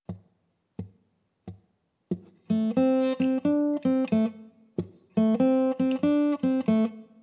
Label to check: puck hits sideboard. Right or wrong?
Wrong